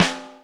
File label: Snare, Korg 05R-W Korg Snares; 300 Drum Machines